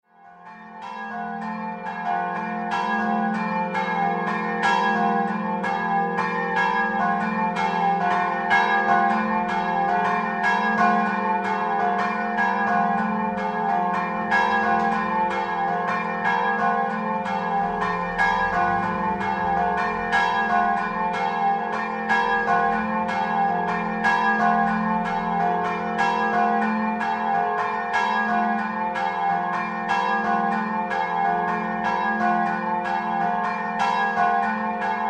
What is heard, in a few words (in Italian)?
campane; CLENIA